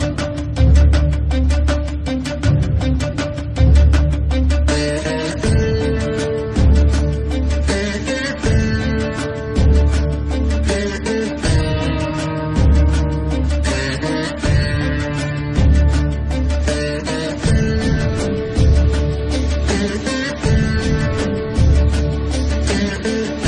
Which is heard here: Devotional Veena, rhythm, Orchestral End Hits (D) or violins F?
Devotional Veena